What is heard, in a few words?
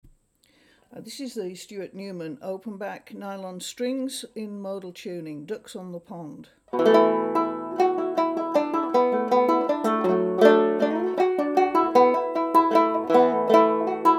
nylon, banjo; stuart newman